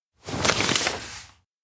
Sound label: counter note spread; anemoi